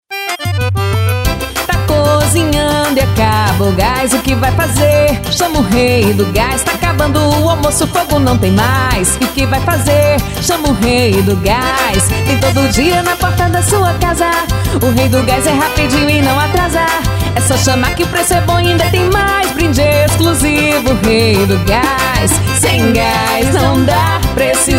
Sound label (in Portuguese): jingle gas; rey do gas